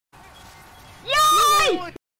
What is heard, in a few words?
yayyyy